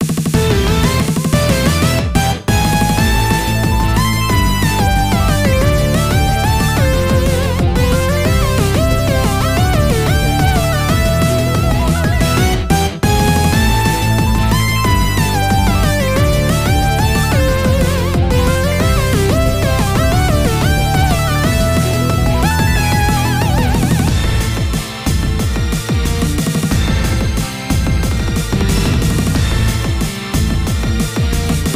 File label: Bossbattle; The Legend of Dark Witch 3 Wisdom and Lunacy